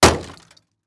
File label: wall plastic; Golden Crayon Sounds